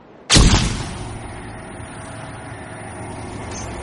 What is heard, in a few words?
gun sounds